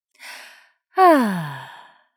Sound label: Ahh Yoga Woman